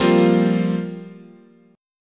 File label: F Suspended 2nd Suspended 4th, guitar chord